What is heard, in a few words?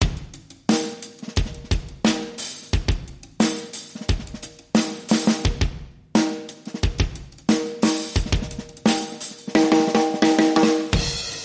Funk, Drums Only